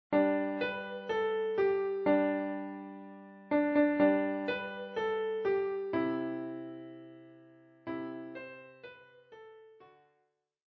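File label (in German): Klavier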